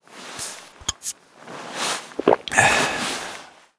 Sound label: drink beer use; Stalker Anomaly; interface item usage